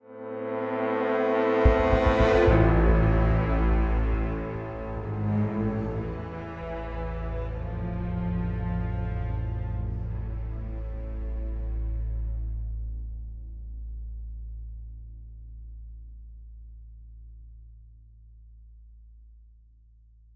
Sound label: String; Assassin's Creed Unity